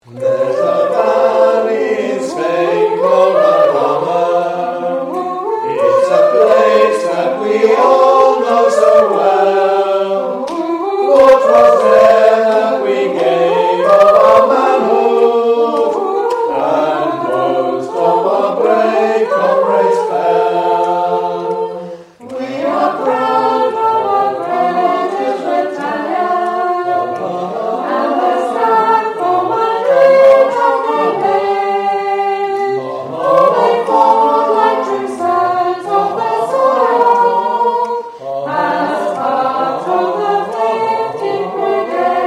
Jarama All

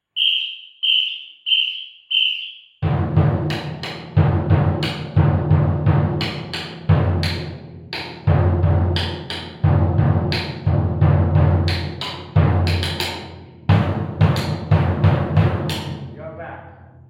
samba Break

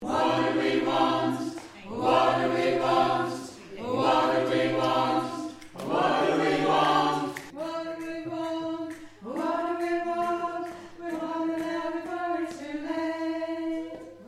Alto

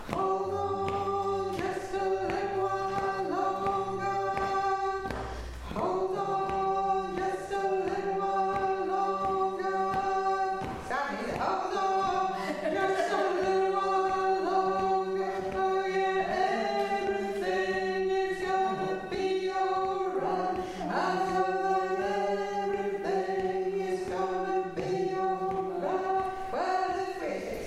Tenor

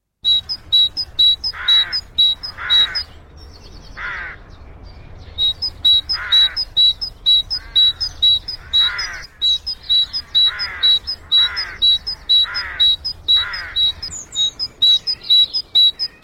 great tit, birdsong

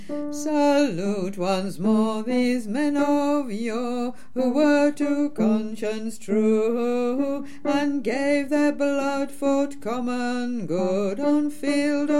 chorus bass; Peterloo

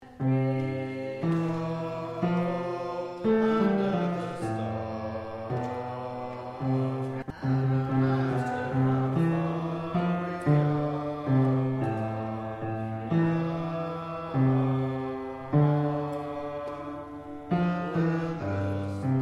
Bass

